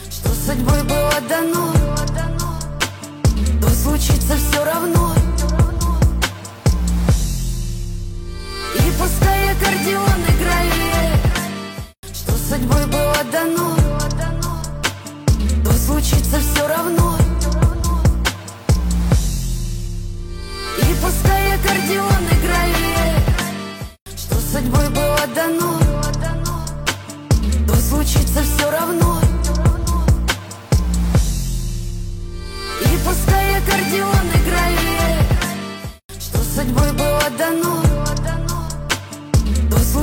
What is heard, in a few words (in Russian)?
akkordeon